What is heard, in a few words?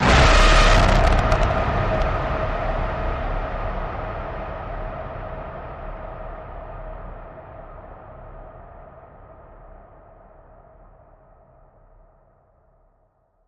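Blast; Lacerate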